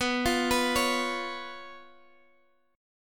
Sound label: B Suspended 2nd Flat 5th down Guitar Half Step Down